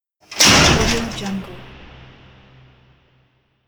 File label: metal destruction impact; pluggedinrecords